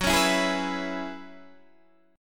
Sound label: Gb 9th Suspended 4th Gb down Guitar Open D, chord